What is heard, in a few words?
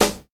high quality original acoustic snare sample g sharp key